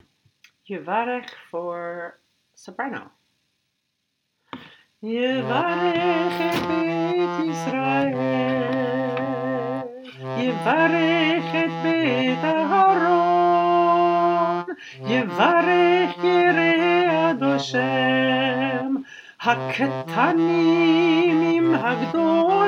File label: soprano